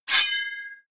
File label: sword pull sword knife out